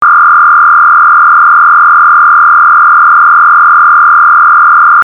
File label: Tone